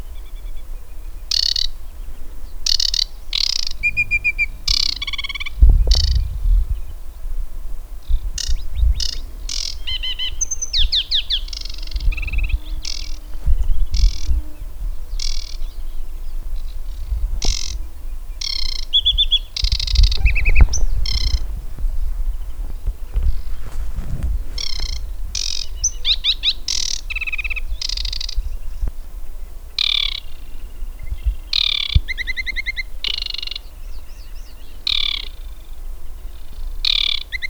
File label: Aquatic warbler